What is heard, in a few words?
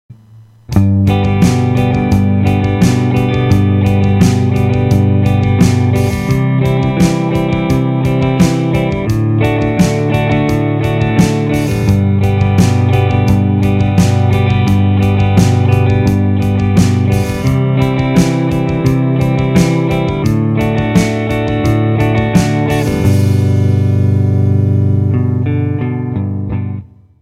The Country Strum Pattern; Strumming 101